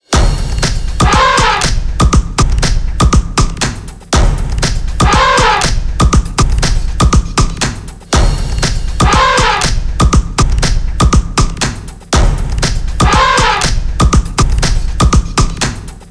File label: Ringtone; Caparol